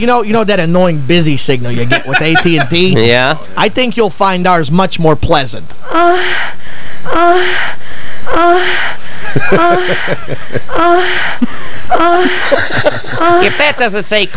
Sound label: ahah